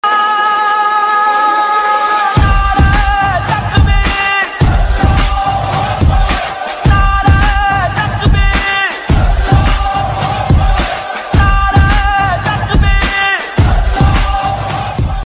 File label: islamic ringtone; nar e takbeer